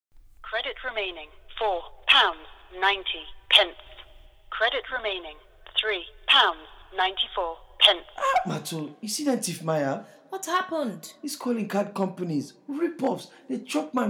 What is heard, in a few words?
Jingle; Salone Talk